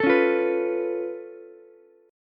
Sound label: Db Augmented 7th Db down Ukulele; strummed; JGuitar